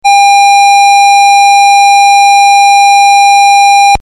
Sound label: tone 800